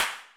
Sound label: rim, Roland MC-909